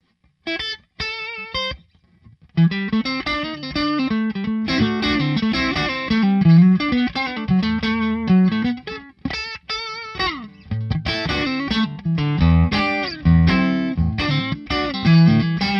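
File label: Neck Pup, guitar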